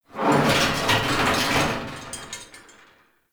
Exterior Tractor Metal Groan; Ambience; Props; Warframe; Duviri

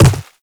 Quadruped Medium Walk Dirt, Footsteps

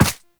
Run Ice, Footsteps; Warframe; Operator

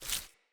Corrupted Sproutfolk Grunt Foley Jump; Enemy

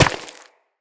Run Rock, footsteps; Operator

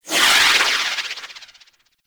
Void Angel Corruption Healing Proj; Abilities; Warframe; Zariman

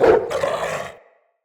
Pack Wolf Attack Bite; Animals

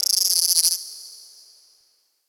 Ocean Critter Insect; Warframe; Grineer Ocean

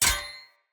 Short Sword Impact Parry Sword; Weapon Impacts; Enemy; Swords; Sinecure Short Sword

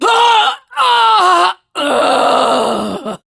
Death; Warframe; Venkra Tel; Battle

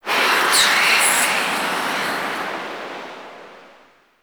Tailor Shop Door Whispers; Ambience; Gameplay; Warframe; Nineteen Ninety Nine

